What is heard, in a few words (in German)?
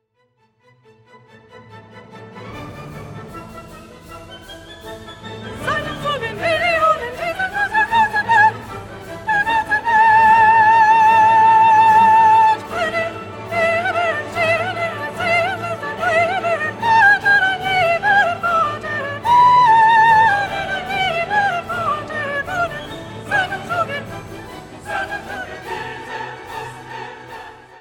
Seid umschlungen Normal SOPRANOS